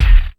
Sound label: Kick, Ensoniq ASR-X Pro